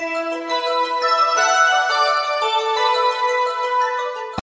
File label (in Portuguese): vinheta